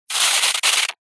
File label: foley liquid foamy bubbly squirt spit hard fast